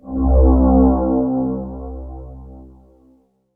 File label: E-Mu Proteus FX Dark Ages C2; ProTracker 2 Clone